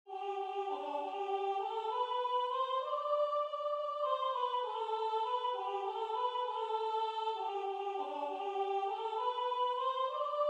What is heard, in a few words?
Soprano; Entzun Abesbatza; Atzar Gaiten; A. Lesbordes